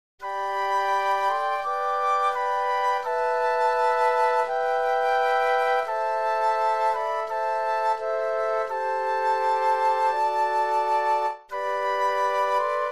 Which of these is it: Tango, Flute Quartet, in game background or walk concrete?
Flute Quartet